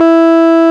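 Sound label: RMI piano E 3, EP; Sonic Reality - Vintage Timetraveler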